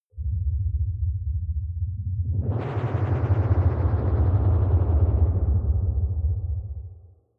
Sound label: ufo flyby deep noise